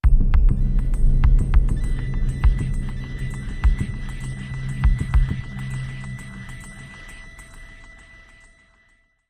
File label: Beat Sting